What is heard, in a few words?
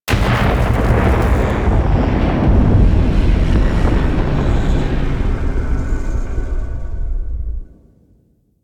Transition Ship Rumble B; Missions